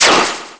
Chain Whip; Sheik; Zelda

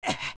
Adult Link Ledge Climb; Link climbing up a ledge

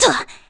Crash Recover; Link recovering from crashing into a wall; Zelda

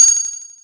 Bow Pulled; The Hero's Bow pulled back completely; Link

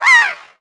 Seagull

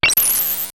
Ice Rod; Use the Ice Rod; Zelda

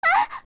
Young Gasp; Zelda

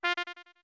Notes Pipes F short; Deku Pipes (short)

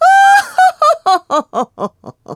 Laugh; The Lady summoning her pets (Laughing, "Hmm...!", "Ooooh...")

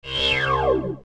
Target Enemy; Z Target; Zelda; OOT

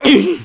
Jump; Yuga jumping away from Link; Zelda

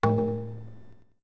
Notes Drums A long; Goron Drums (long)

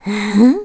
Grandma "Hmm"

